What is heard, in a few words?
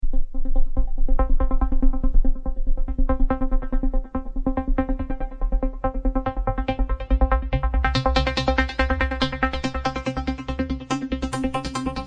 tranceline pluckers, 140; REMIXABLE COLLECTIONS